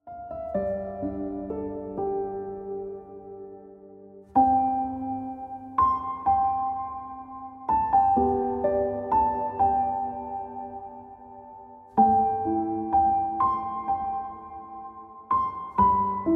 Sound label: Ringtone